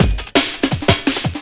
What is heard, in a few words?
Fuller Break, Jungle; Breaks; Blu Mar Ten - Jungle Jungle - 1989 to 1999 Samplepack